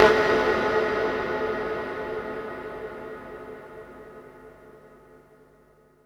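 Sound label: percussion fx; Best Service Dance Mega Drums; PERC-FX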